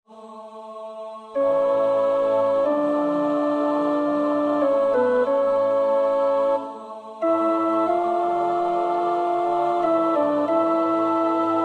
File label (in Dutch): alt, koorpartij oefening; Weelkes (Thomas)